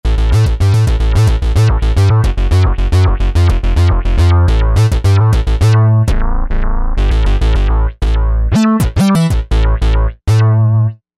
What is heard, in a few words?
Moist Bass